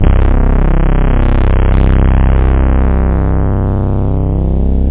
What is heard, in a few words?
303 user prog, tb303 samples